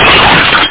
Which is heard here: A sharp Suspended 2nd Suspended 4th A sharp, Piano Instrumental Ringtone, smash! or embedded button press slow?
smash!